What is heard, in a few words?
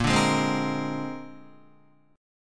Bb 9th Bb down Guitar 4F,4A,5C,5G,5C,6E; strummed